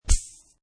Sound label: Short air pressure released sound; Tech; similar to star trek hypospray sound, could be use as an injection sound